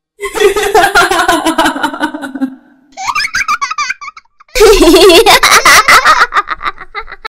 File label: horror sound creepy little girl sounds